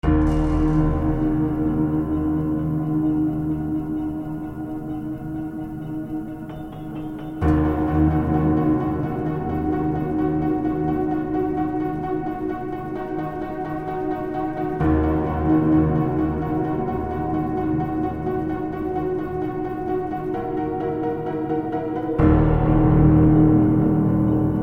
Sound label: Pianos, Radioactive Pianos from the Chernobyl; PRIPYAT